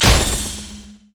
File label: arcthrow miss; LoL; diana skin02